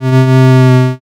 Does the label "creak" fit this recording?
Yes